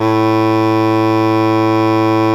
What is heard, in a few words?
BASS SHAWM; SoundBytes - Early Patches